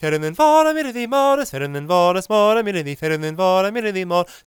GAELIC, World Voices; ILIO; Vocal Planet

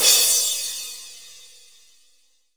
CRASH, Dance Mega Drums; Best Service; CRASHES

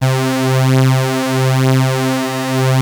OB C4, Rare Synthesizer; Hollywood Edge - Giorgio Moroder Rare Synthesizer Collection; OB 4 VOX.

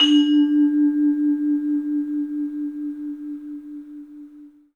bell; 3D Sound; 3D Keys VOL-1

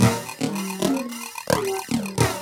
LOOP, TECHNO; TECHNO-BOX; ELEC-LOOPS

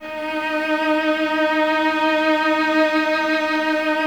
violas D#4, String Ensembles; Miroslav Vitous; VLS 1+2

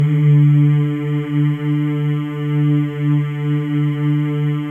male; Spectrasonics; Symphony of Voices

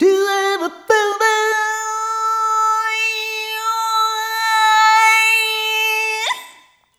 Rhythmic A

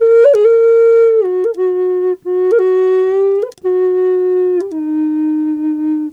EF wild flute; Big Fish Audio - Didgeridoo and Other Primitive Instruments

